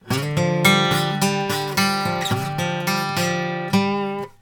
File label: Blues Guitar; ILIO; Fretworks; LICK